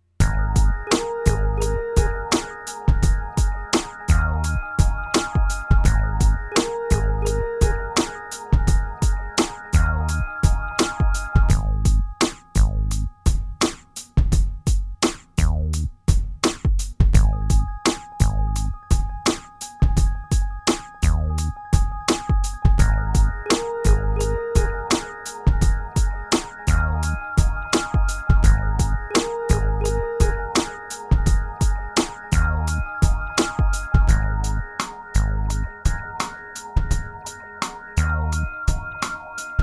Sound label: HipHop